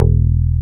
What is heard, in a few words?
KLIK BAS